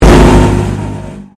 Convolution Pulse

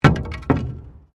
Wood On Wood

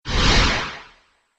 Modulation Whoosh; Whoosh Sound Effects

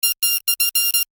Digital Incoming Signal Fax Receive; also telephone SMS message receive; Digital Sound Effects

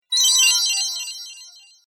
Fairy Magic Spell; Magic Sound Effects

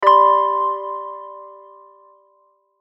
Logo Accent Synth; Sonic Logo; Blastwave; Sonic Logos; Production Element Imaging Element Accent Transition

